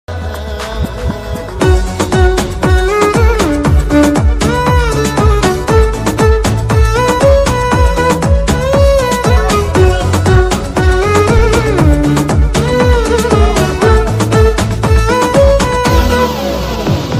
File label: theme music ringtones